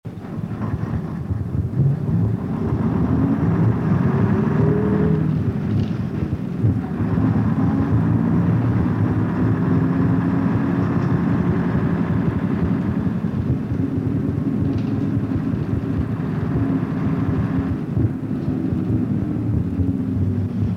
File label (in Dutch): Leyland geluid; VAD Leyland Verheulserie; 1976; Apeldoorn